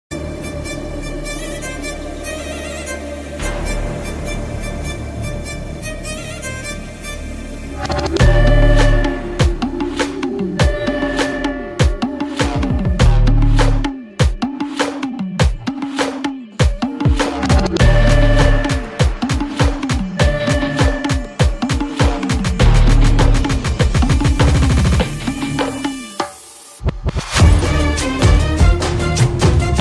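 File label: background music ringtone movie background music; Naane Varuven; Dhanush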